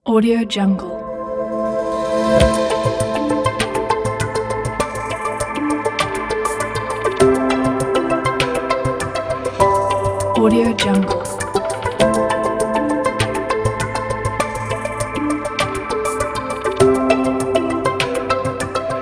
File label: ambient electronic documentary